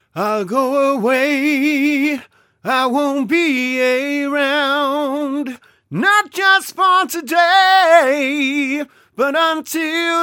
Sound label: Soul Ballad Male Dry; se electronics z5600a ii